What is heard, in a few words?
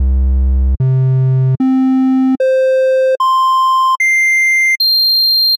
Triangle, struck instrument simulator